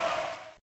Darkball Hit

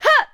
Attack vox

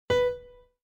Piano; Scarlet Hood and the Wicked Wood

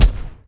footstep; ice age scrats nutty adventure

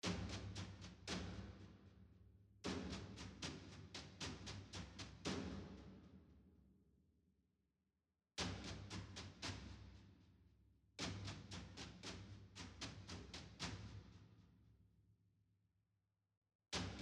Tension Block; The Last of Us

